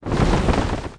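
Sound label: Se Battle Hit Clod wave; New The Denpa Men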